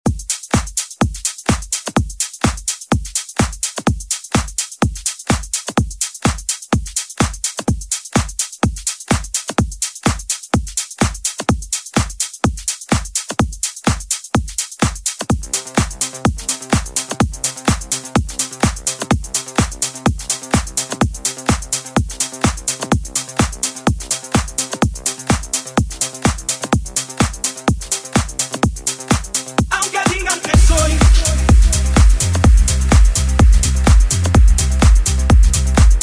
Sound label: Dance Bootleg; remixplanet